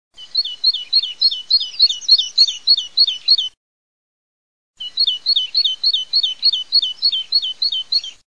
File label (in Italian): CINCIALLEGRA cinciallegra, birdsound